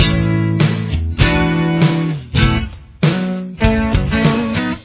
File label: chorus, guitar